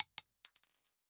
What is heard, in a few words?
casing 9mm; arccw; weapons